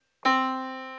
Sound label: yamaha Disk